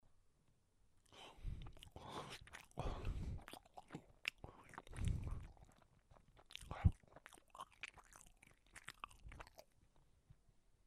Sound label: Eating Banana